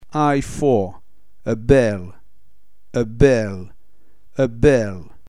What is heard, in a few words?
a bell